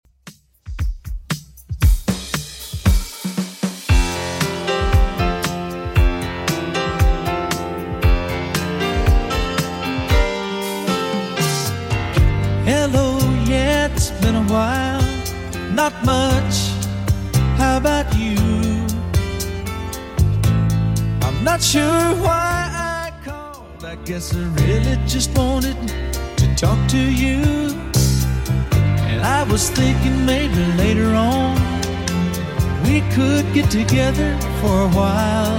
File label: 70s 116bpm; Redrum